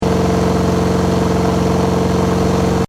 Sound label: Chevy V8 Hot Rod Engine Loop, Short looping sound of Hot Rod engine, moderate speed; Hot Rod sounds; Car Sound Effects; Lynne Music